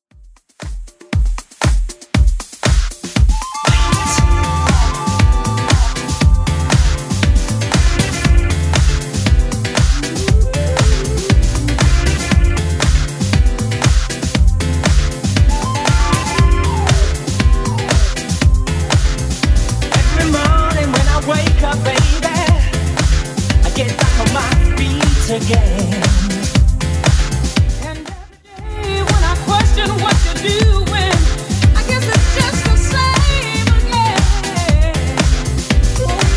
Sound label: 80s Club Edit 118bpm; remixplanet